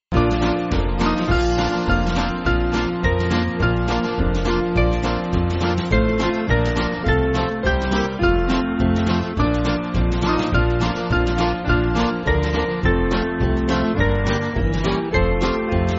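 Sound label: Band, Backing Files for Churches; Laban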